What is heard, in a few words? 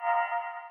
MOVE; C64 Dreams; Multi-Sound Default